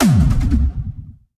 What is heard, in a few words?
unleash charged; weapons; dota; marci